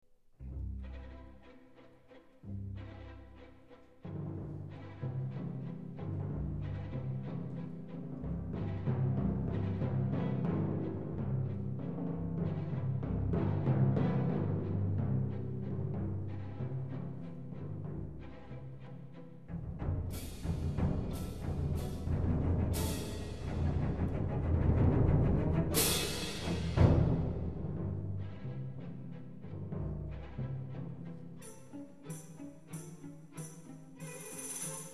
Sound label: percussion ensemble, classical music